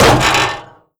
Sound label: vent step, headcrab reviver, creatures